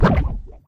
dry fire

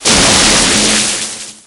zap discarge

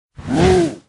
giant attack swing

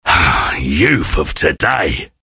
hurt vo

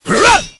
barbarian attack

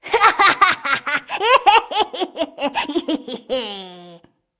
laugh; Fizz

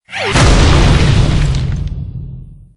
golem deploy

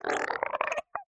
strider idle; Minecraft

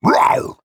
Sasquatch hit

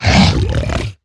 hoglin attack, 疣猪兽：攻击; Minecraft; 我的世界; 疣猪兽是一种生成在绯红森林的攻击型生物。它们是目前唯一可繁殖的攻击型生物。

